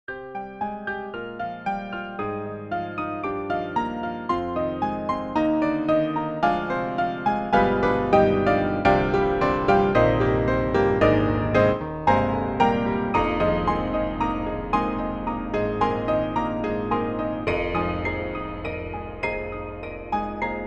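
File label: Broken Piano Double Piano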